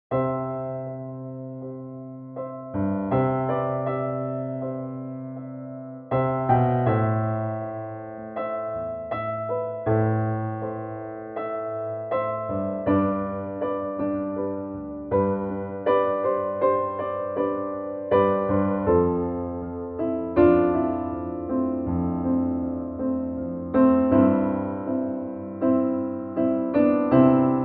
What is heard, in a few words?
I vi V IV Piano C